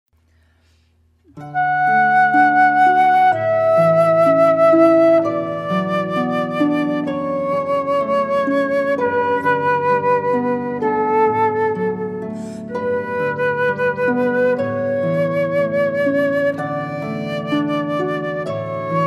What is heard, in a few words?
flute; Pachelbel's Canon in D